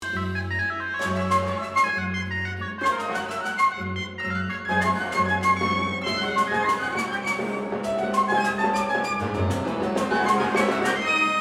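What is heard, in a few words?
climax flute EWI piano harp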